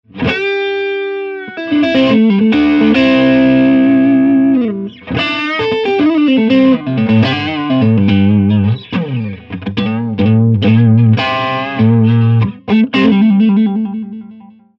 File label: 6L6 crunch boost